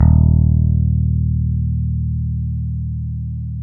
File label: BS P BASS, E. Bass; Roland LCDP02 Guitar and Bass